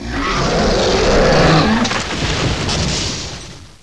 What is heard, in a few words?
roar die; duke nukem total meltdown